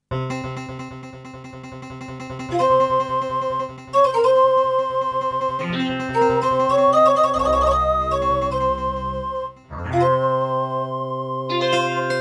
Doepfer GMX-1; Prince of Persia 2 - Intro

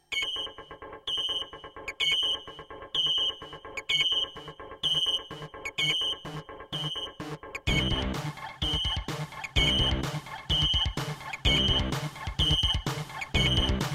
Roland SC-8820 55MAP; System Shock

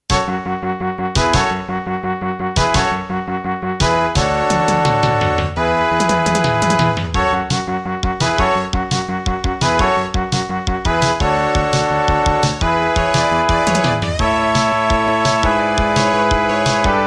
Intro, Creative Sound Blaster AWE32; 4D Sports Boxing

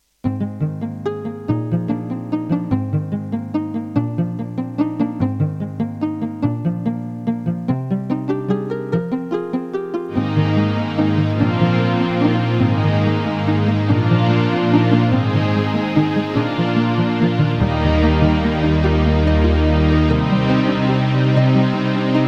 Irish, MidiPlus MiniEngine USB; GM MIDI

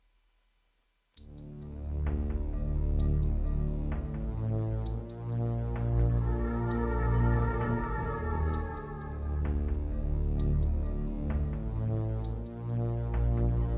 Labway LWHA111800 (AdMos QS700); Descent II - Briefing

